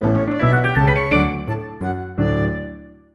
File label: Gravity Galaxy - Clear; Mini Mario & Friends: amiibo Challenge